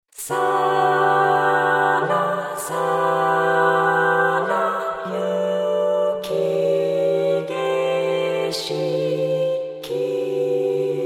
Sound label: vocaloid